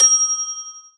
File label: balloon pop, pop; Spanish